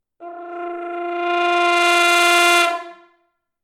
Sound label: Horn Fluttertongue Tone F4